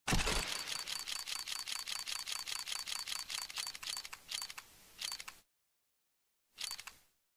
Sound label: roulette sound